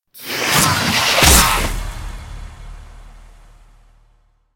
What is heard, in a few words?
multiplier Strike; conan